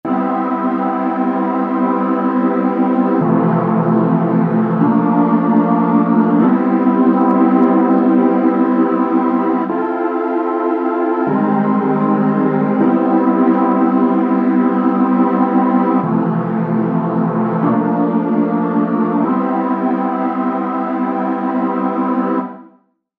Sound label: Chrd Maj7